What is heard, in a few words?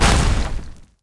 Smash Buried Items; subway surfers city sound effects; Android, iOS